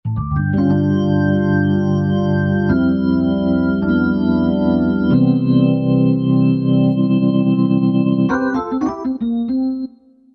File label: B3 Mod Aft; Roland SRX-03 Studio SRX